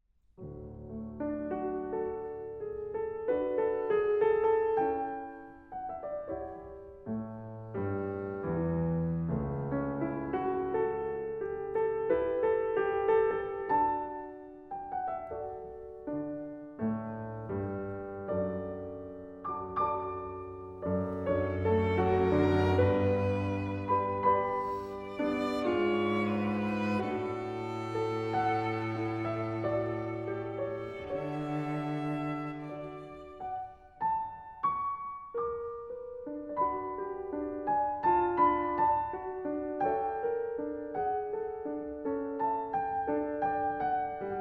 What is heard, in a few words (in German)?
Klavier Trio